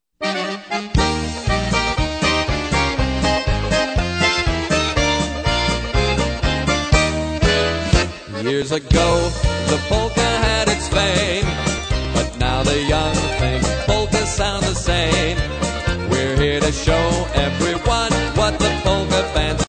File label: polkas